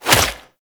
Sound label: Infested Tendril Step, movement; Zeke; Warframe; Infested Lich